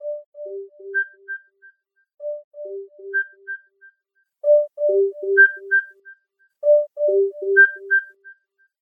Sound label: rise ringtone